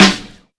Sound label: Sizzly Snare Drum Sample G Key, steel snare drum tuned to the G note